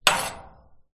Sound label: impact metal scrape